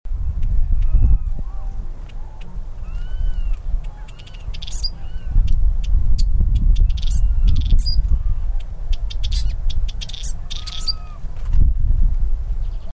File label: Pseudocolopteryx dinellianus, bahia